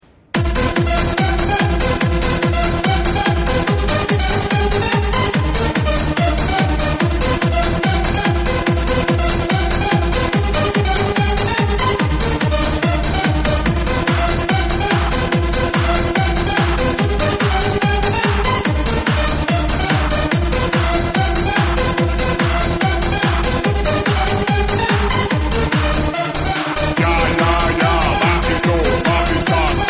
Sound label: hard trance mix extended